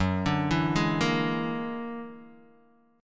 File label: F sharp Augmented 9th, Guitar, DADGAD